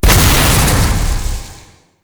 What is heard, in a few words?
Explosion Medium; Weapons; Warframe; Duviri; Incarnon Adapters